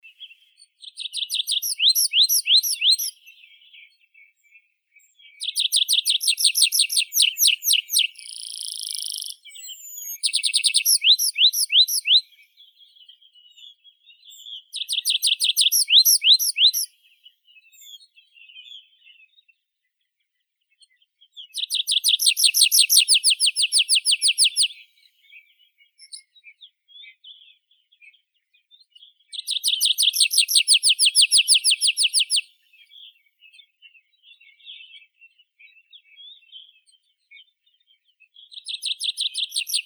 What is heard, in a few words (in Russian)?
konek lesnoi anthus trivialis